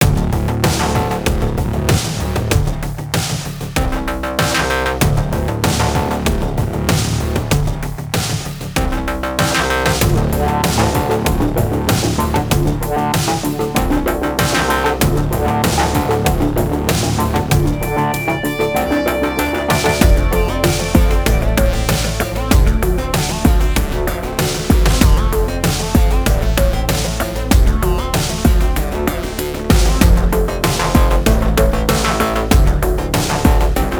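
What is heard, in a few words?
Music Electronic, Electronic Pre Race 96bpm; Music Elec 3#1 (Pm Elec Pre Race 3 96bpm Game Mix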